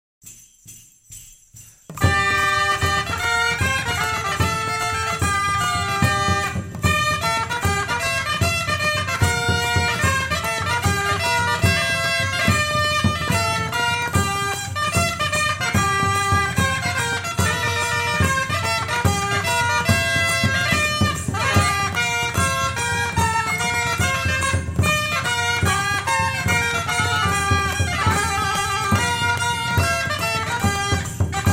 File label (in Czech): vzdusnicova salmaj alt tenor